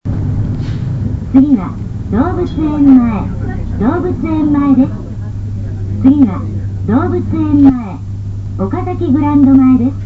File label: bus tape orgel; kansai; zoo